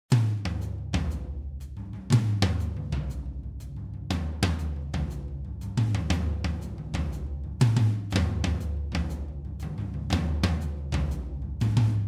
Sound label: Drums DRY